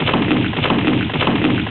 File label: WATERWHEEL